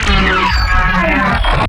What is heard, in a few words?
glitch